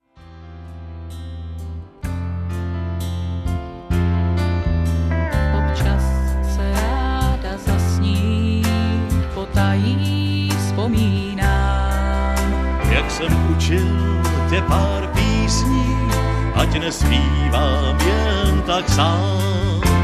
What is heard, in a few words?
Duet, Country; Country Pod Kuzi